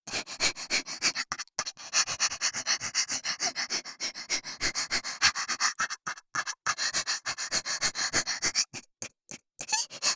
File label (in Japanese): 喘ぎ声; ルナ